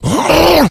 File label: rage, infected, male; Left 4 Dead 2; Source Engine